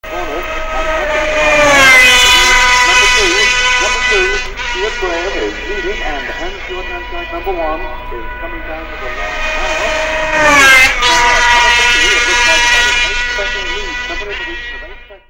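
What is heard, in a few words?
Suzuki 50, motorcycle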